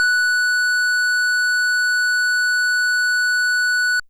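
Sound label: FM Sq Dist DX100 F#0; square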